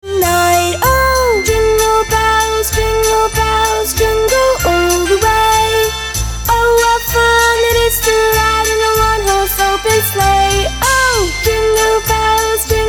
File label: JINGLE BELLS